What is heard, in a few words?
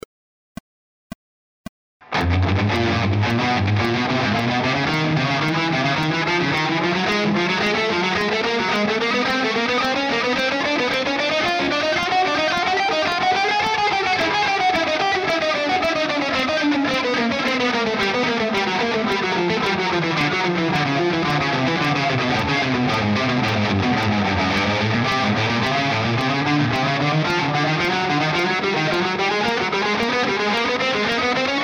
picking exercises